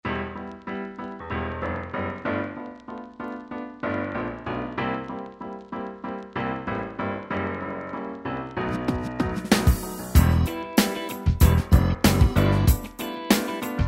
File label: Piano Soft Rock